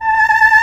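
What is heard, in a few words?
Chamberlain voice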